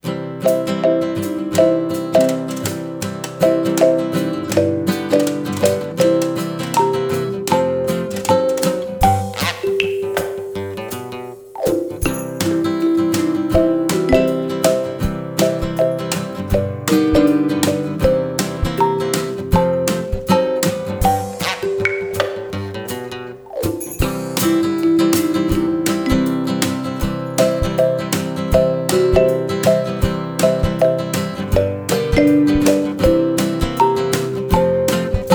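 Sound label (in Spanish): ratona instrumental; La ratona ladrona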